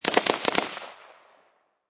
Machine Gun Colt Ar-15 Short Burst Random Fire Distant Range